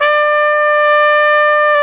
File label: Solo Brass, Tpt p, AKG